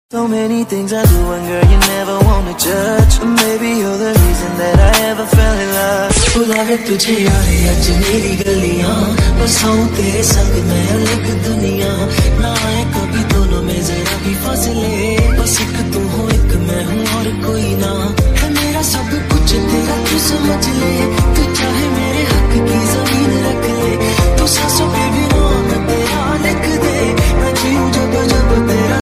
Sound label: cool stylish ringtone